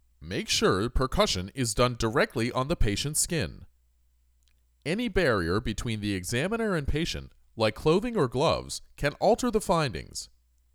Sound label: Percussion